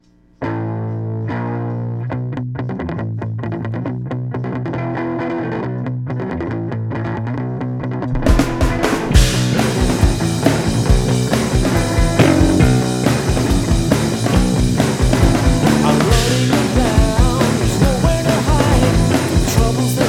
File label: drums